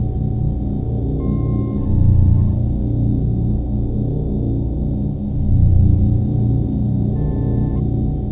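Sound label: warp core ambience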